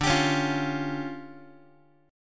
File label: D Minor Major 7th Double Flat 5th, D, Guitar; DmM7bb5; strummed; JGuitar